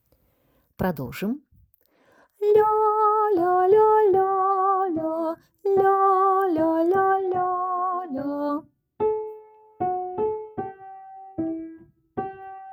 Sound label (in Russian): мелодическое эхо